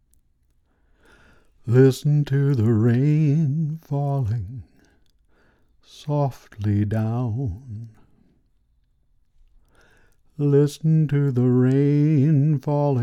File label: Slow Rain